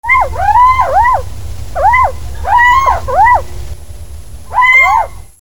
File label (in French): chat-huant